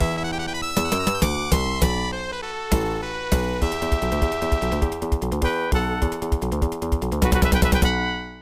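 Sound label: background music; Block Block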